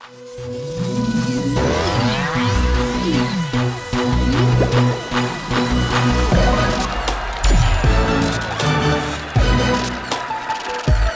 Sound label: Trivia App Intro